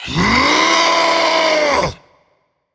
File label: battlecry; smite; ravana